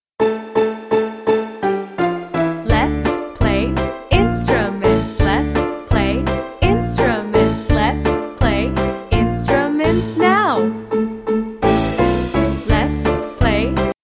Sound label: Lets Play Instruments